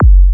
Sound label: KOMMERZDRUMS